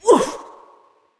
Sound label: Attack Light, Creature; Eydis